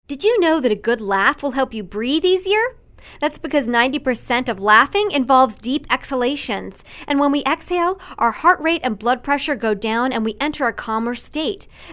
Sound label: Laughing